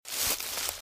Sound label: Footsteps - Bush walk - Left; Eden; RadwinIsland